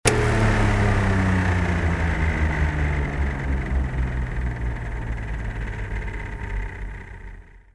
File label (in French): Ventilo arret; bruitage; Electro-menager